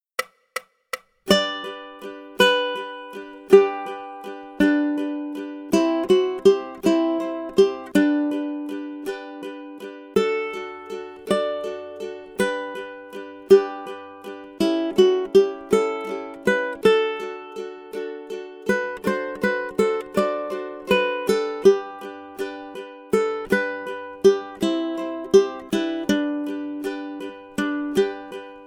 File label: Daisy Bell, ukulele; grade1